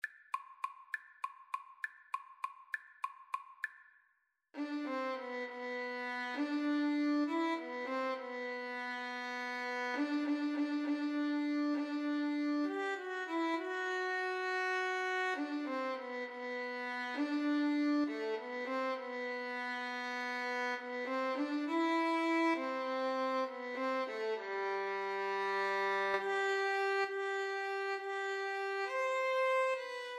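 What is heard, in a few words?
flute violin karaoke; Violin-Flute Duet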